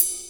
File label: Drum Soft